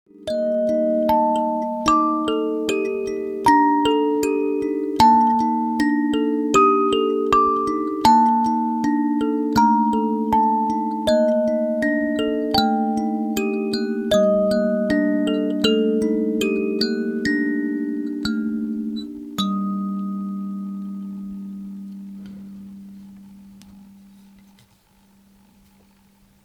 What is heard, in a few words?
15tone Gminor 2row kalimba; 15 tónová; jelšová kalimba; Tapio